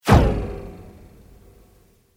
Sweep Mechanical Fast

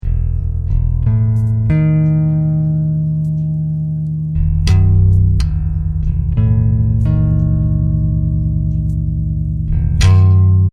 Hip Hop Bass Guitar Loops 90 Bpm E; Royalty Free Guitar Loops

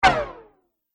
Multimedia Swipe, transition; Andrew Fleming

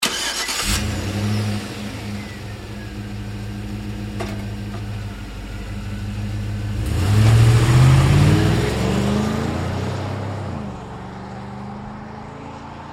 Truck GMC Savana Start Away Fast; 2010 GMC Savana; Trucks; Vehicles

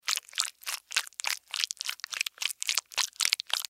Squish Movement, Squish Rhythmic Juicy Slime; Squishes; Foley; Blastwave

